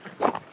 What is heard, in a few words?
Stony Step, outdoor, Footsteps